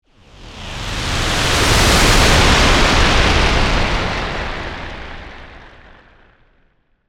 Meteor Pass By, Scifi, Meteor, Pass, By, Science, Fiction; Sci Fi Sound Effects; BlastWave